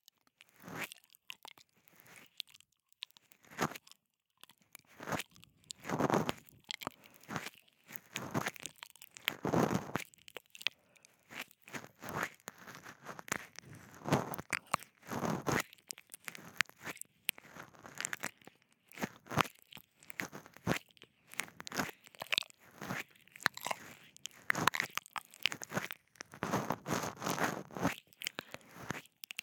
いちゃいちゃ耳舐め

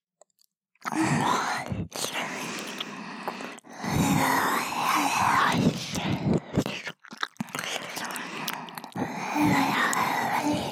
はげしくぺろぺろ, 右耳; カスタマイズトラック; フリー耳舐め（右耳パーツ）

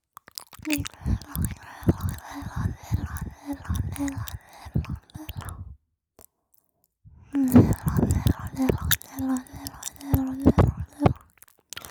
レロレロレロ…, ASMR 左耳

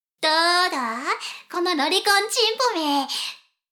しこしこ音声; 少佐殿; 牧場戦腺

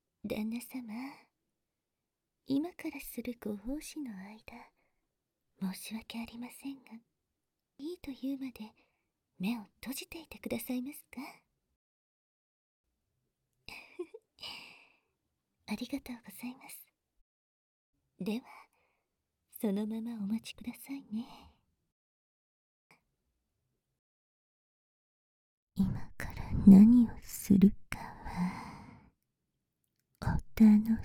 両耳囁き トロトロ 耳舐め バイノーラルマイク; 効果音なし; 責め

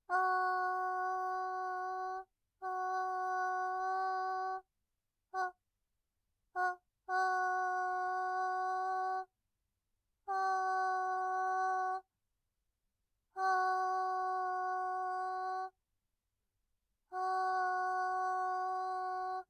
あーーーーーーー

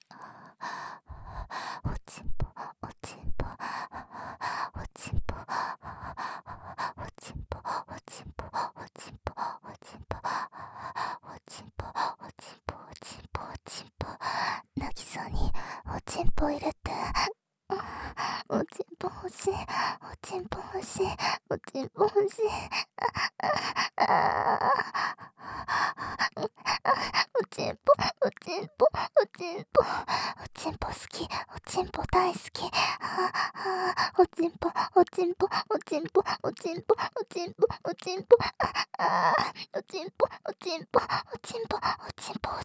喘ぎ; お屋敷メイドのハーレム淫語ヘヴン 気が済むまで耳舐め淫語を堪能できるバイノーラルオナサポ音声; 右差分

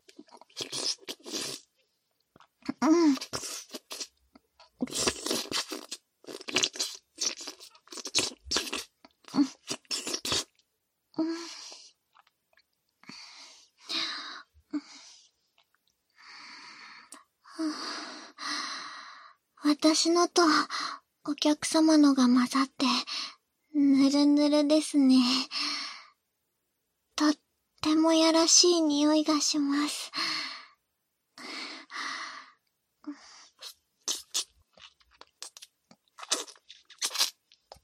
お掃除フェラ; 外出しした精子をお掃除フェラしながらもう一回射精（簡易モノラル 効果音なし）

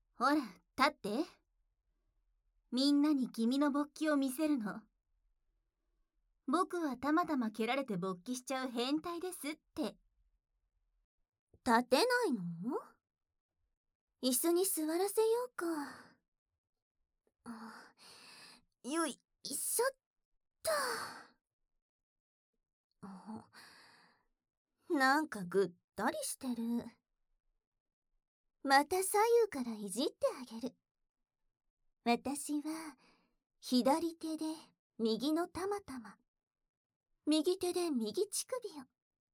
左右から囁き耳舐め; 玉潰し

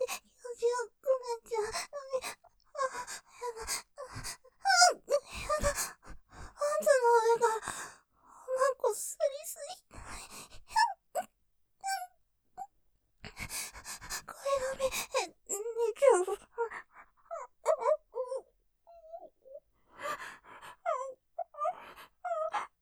喘ぎ; 気持ちよくなっちゃう；；だめぇ；；パンツの上から；；; ループで楽しむ！シェフの気まぐれ抜き抜きコース

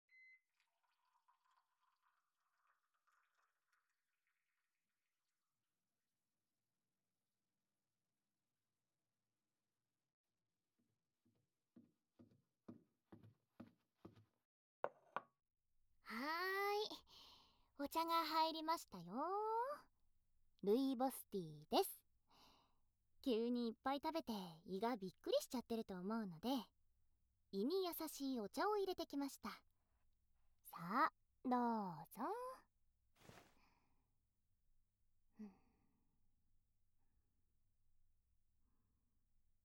膝枕で目元＆首元マッサージですよ(吐息）

